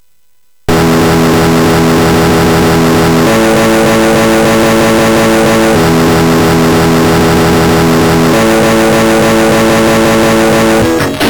Digi Guitar, MOS6581R4; hvsc

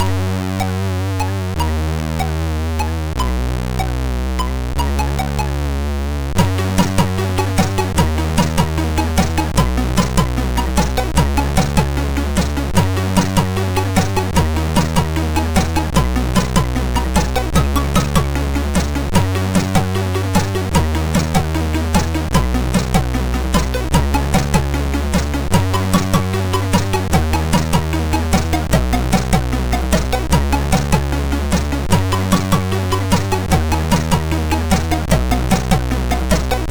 sid MOS6581R4, GAMES; Bounch preview

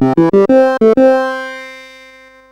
CSG8580

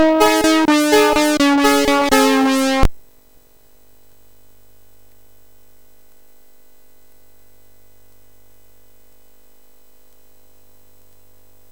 Sweep, MOS6581R3

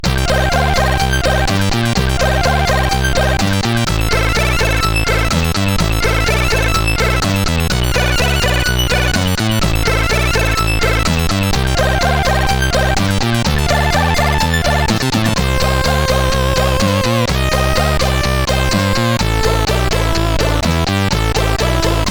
Test, CSG8580R5

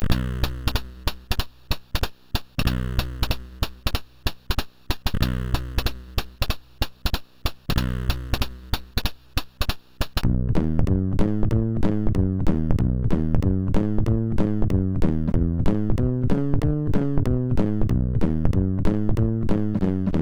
Engine, MOS6581R2

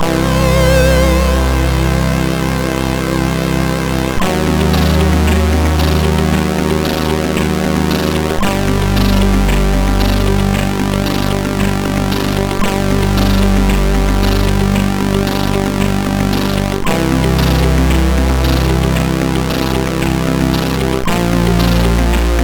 E-Load loader, MOS6581R4; hvsc

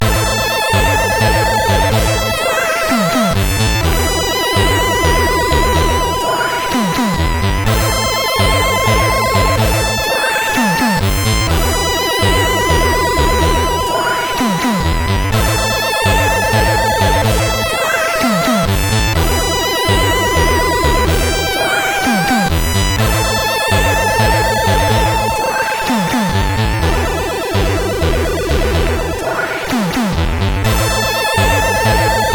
X-Music Remix, CSG8580

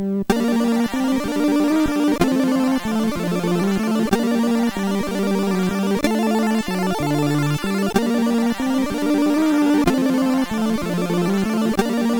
intro, MOS6581R4

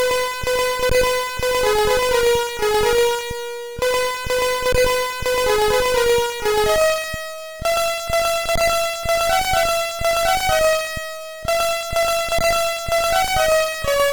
Melody, sid CSG8580R5; HVSC; Beauty

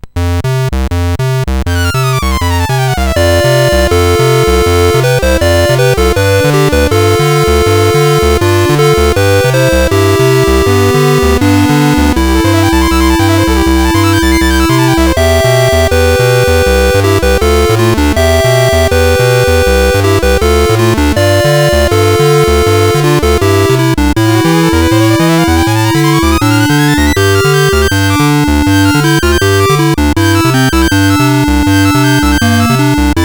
Music sid CSG8580R5

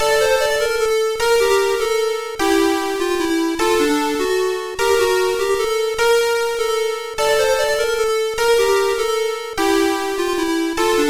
Gameover Tune, sid MOS6581R3

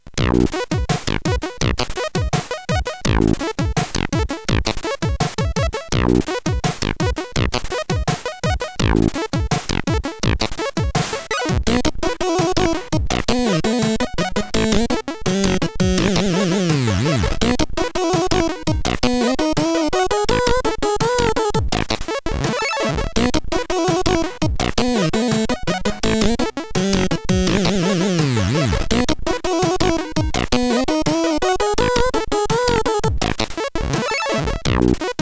Score, CSG8580

